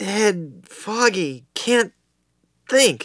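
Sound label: dying; nwnds audio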